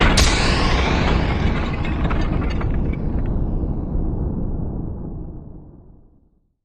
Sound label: Rattle And Big Impact Sweep